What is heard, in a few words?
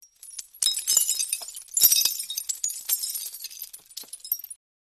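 falling glass shards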